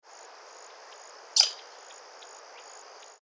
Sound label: Blue coua; پرنده کوآی آبی